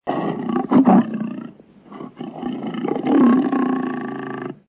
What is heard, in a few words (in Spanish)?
bestia